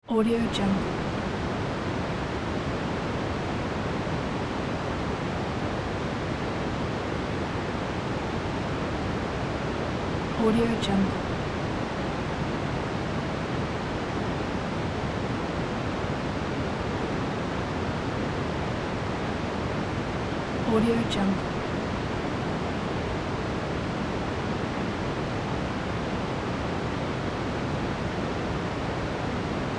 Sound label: hot dry wind; magicsoundeffects